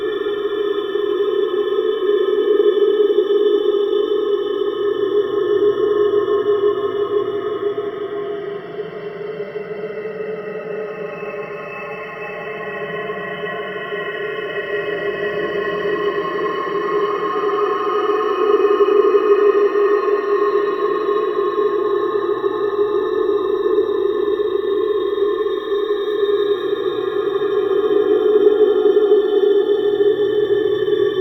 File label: Space Exterior Amb; Warframe